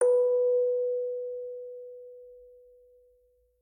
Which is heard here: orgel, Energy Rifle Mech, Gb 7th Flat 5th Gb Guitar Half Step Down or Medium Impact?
orgel